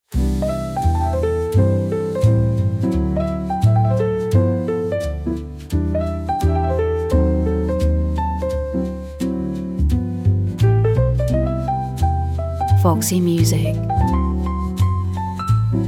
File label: Sweet Old Swing; Foximusic